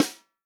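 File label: snare drum, Real Drum Kit; Best Service ProSamples vol.43; POP KIT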